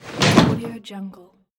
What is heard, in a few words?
putting on chair